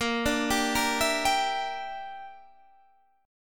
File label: A sharp 6th Flat 5th, Guitar Standard; A#6b5 arpeggiated; JGuitar